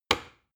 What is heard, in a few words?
plastic button start push heavy